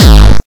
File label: Hardkick